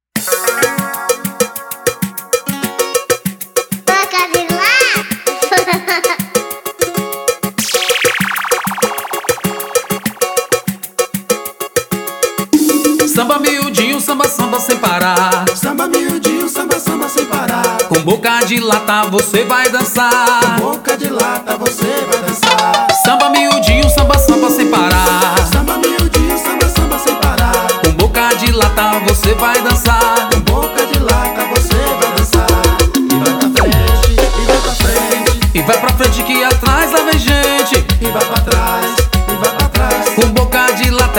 samba

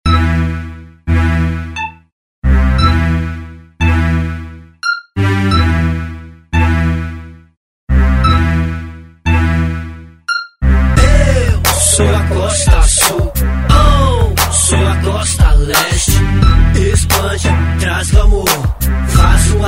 hip hop

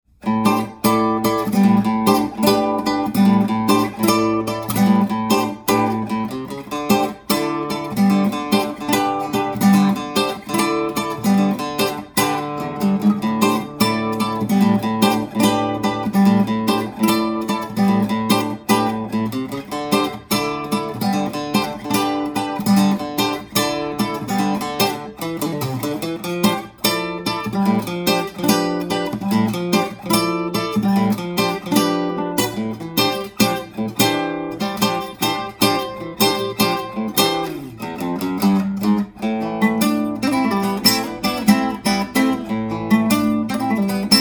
transient blues alternative version

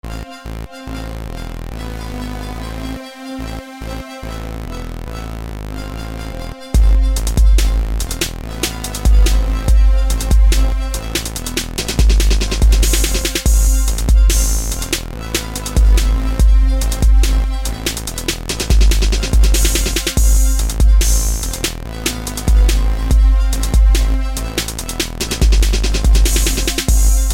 instrumental

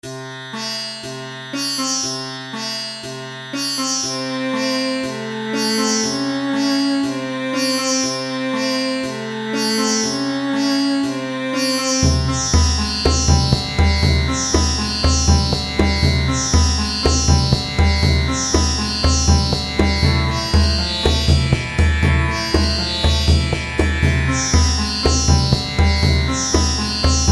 prelude dance

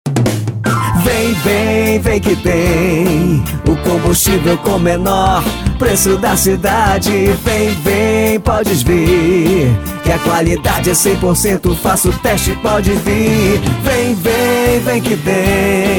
jingle; posto pericuma

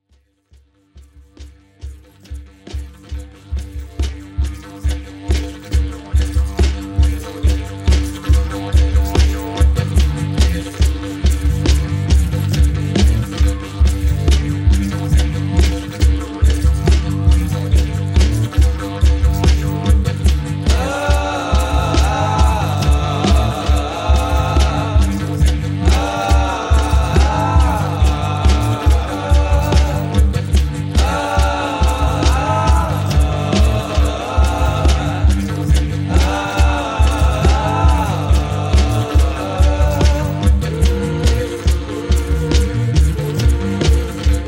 didge

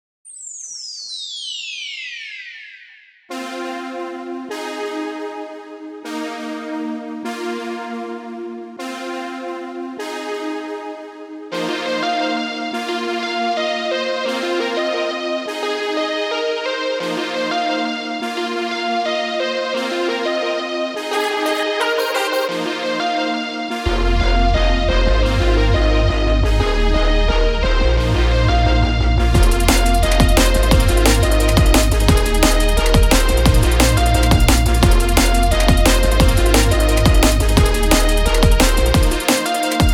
beat music

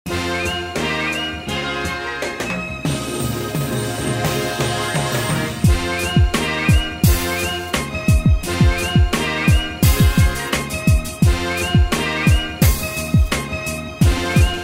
beat

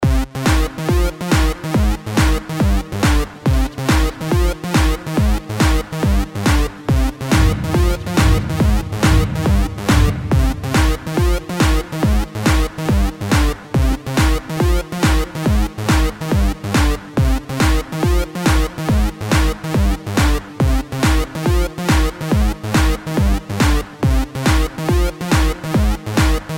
hardstyle

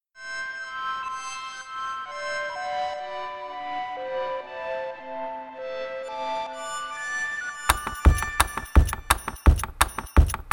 alt rock

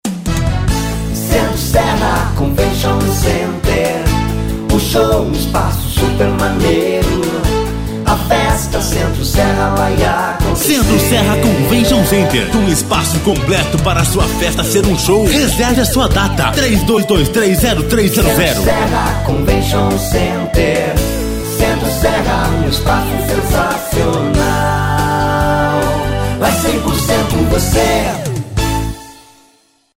jingle convention center; centro serra

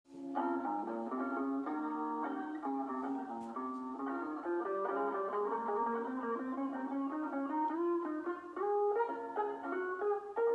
bass latino

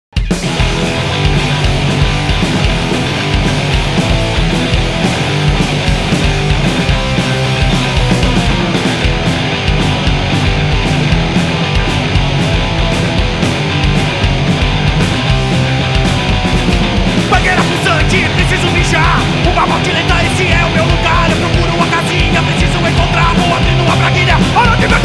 metal

